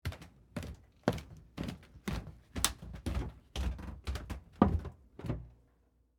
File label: Carpet Stairs Down, Footsteps, Carpet, Stairs, Down, Underneath, Perspective; BlastWave